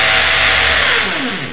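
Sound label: DRILL ELECTRIC, MACHINES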